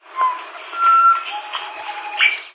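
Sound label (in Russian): пение и щебетание нескольких птиц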